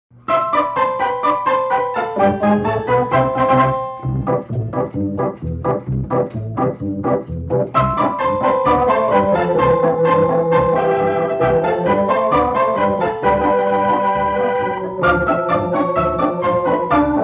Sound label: Organ Grinder